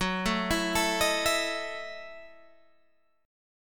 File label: F sharp Minor 13th, Guitar, Open G